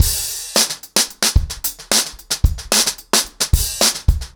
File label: 110BPM, acoustic drums, drumloops